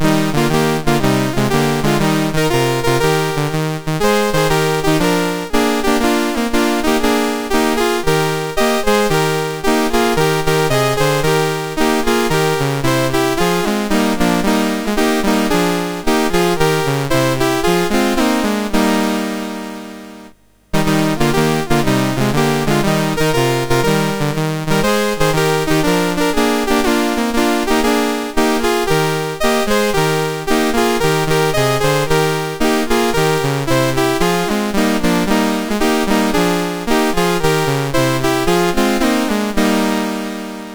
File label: Here we come a Caroling, MOS6581R3